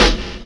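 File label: snare drum hip hop; DRMKIT; E-Lab; X Static Goldmine 2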